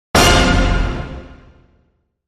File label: Hit Orch Lonely Heart F